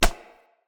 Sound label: Unarmed Punch Impact Flesh